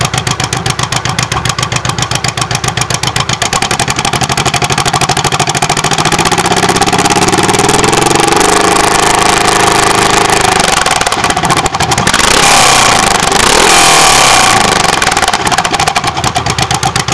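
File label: VTX1800R 92-109db, exhaust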